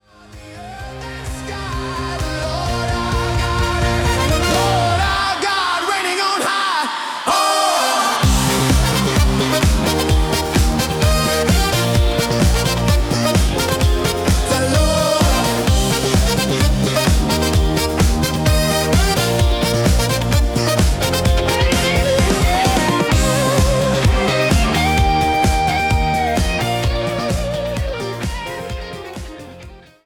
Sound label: Pop Style; latin pop style